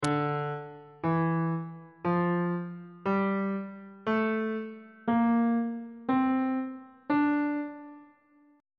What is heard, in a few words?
d minor scale on bass clef